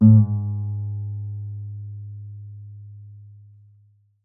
Guitar Acoustic Slide Down Ab

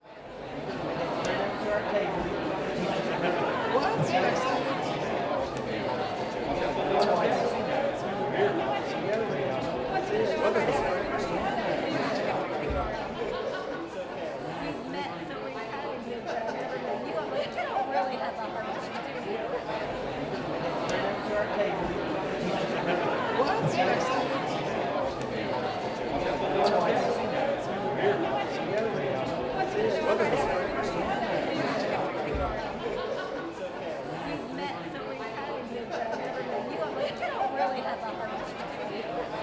Crowd Inside Hotel Event

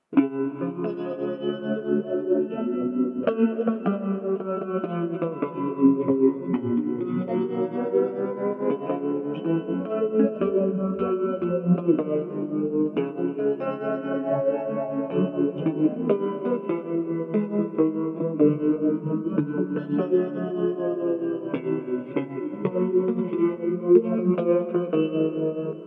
Detuned Weirds

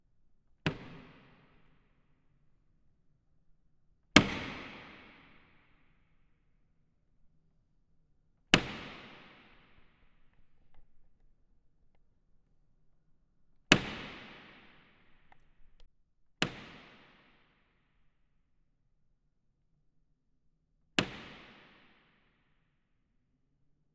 Door Cabinet Slam Echo

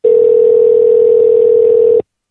Dial Tone us

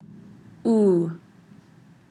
Ooo

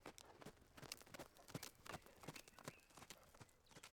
Horse Trotting On Gravel

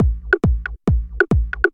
Tech loop

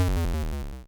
Retro Death

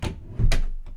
Door Close

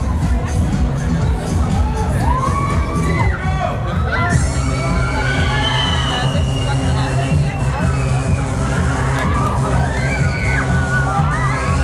Fair

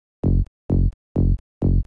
Rolling Bass Minimal

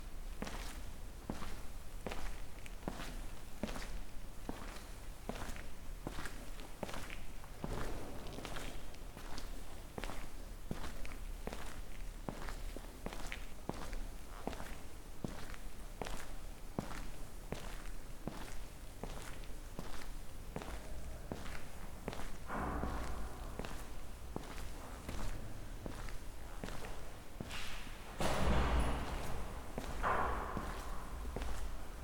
Step In Church

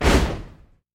Foot Stomp

